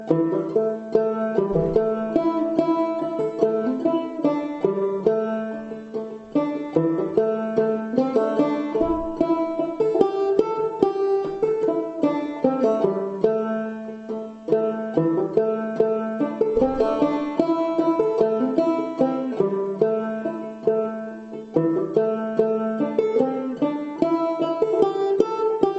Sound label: Banjo